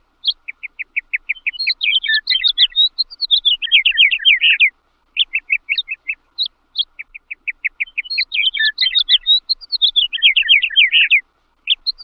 oiseau

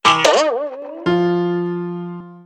marteau; MOBICLIC 57

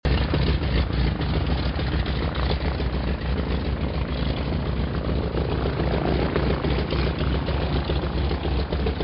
avion

